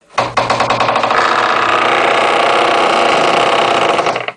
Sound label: ringtone door open